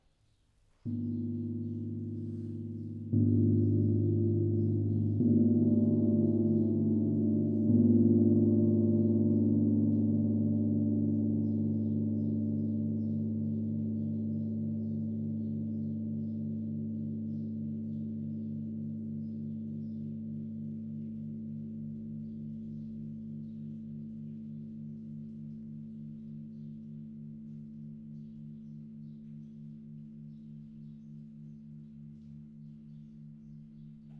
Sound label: gong